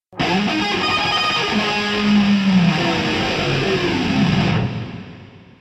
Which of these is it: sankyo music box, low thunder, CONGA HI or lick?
lick